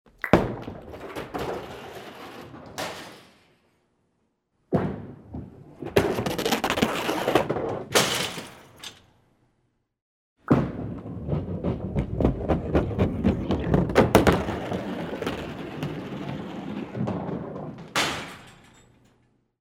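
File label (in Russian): боулинг бросок шар по жёлобку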